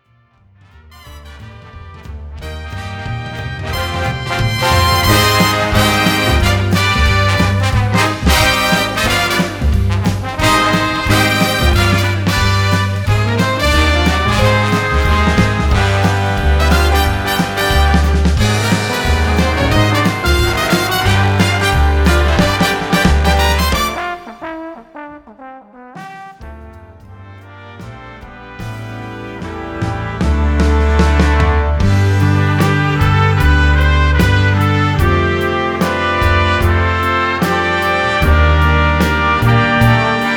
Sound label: Jazz